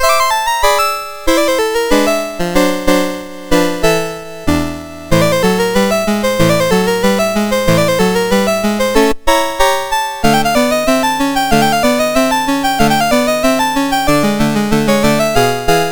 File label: MOS6581R2